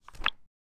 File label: pen detach